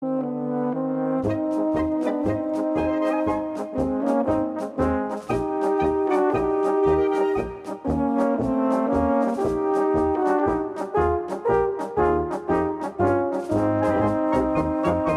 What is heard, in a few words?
polka